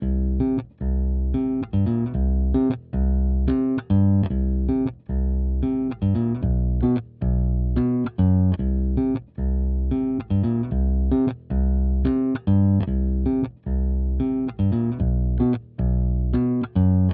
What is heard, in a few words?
Bass; raji an ancient epic